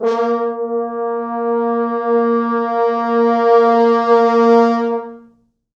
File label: 4 FrHorns; A#2; East West; Quantum Leap Horns Sax; 4 FrHorns MOD